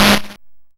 Snare, YM, CHIPSHOP HITS DRUMS; ChipShop Deluxe

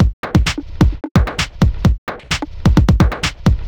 Beat (130BPM), techno; techno drum fx

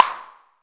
Vermona Snare; techno drum fx; Analogue DrumHits

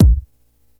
Tech Drum Hit, techno 120bpm

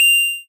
Ym Multi Organ F4; multi-samples; CHIPSHOP DELUXE

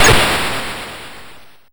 YM Noise; NOISES; ChipShop Deluxe; ChipShop Hits

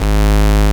GB Bass G#; CHIPSHOP HITS

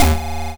YM Chord D#; ChipShop Deluxe; ChipShop Hits

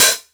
Jomox Hat

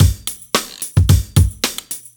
Beat 110bpm; musicradar

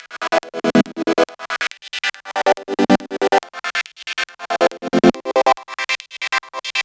140 Chord G, 140 BPM; loops; CHIPSHOP DELUXE

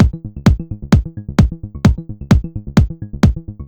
130BPM Kick Bass, techno; drum fx; Beats

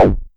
Amdek Perc

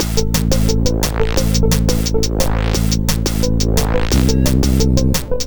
175 Combo Am, 175 BPM; loops; CHIPSHOP DELUXE

